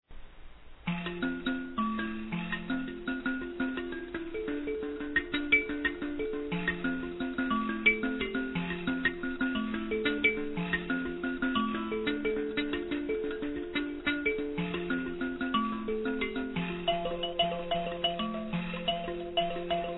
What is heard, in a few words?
Mbira